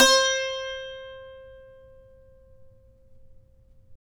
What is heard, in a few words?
SAZ; Roland - LA Composer vol. 3